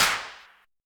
Clap; Vengeance Samples; VEC1 Claps

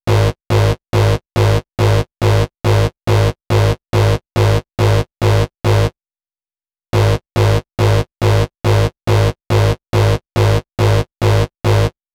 Bass, 140 BPM; Vengeance Samples; Song Kits; Rap; U Got Me Rocking

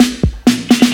Fill Ins 128BPM; Vengeance Samples

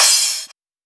Crash; 128 BPM; Out Of My Mind

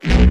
Offbeat Bass H; Vengeance Samples

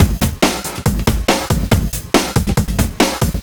Breakbeat 140 BPM

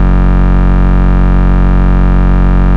Root F#, Bass; Vengeance Samples

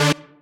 Synth Cuts; Vengeance Samples; VES1 Instruments

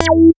Synth Sound; Vengeance Samples; VEE Synth Shots

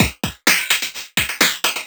VFX2 Loop 128 BPM

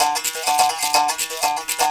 mix percussion loops, 125; Vocal House; PS-28 Vocal House Samples

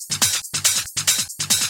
Loop 140 BPM; vengeance essential club sounds-3

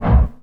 BD Gate FX, Bassdrums; Vengeance Samples

